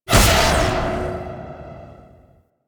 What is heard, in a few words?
Super Weapon Missile Fire; Warframe; Vehicles; RailJack; Proc Ships; Corpus